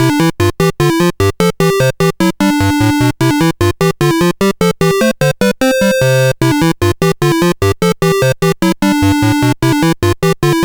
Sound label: sid CSG8580R5; Fahrenheit 451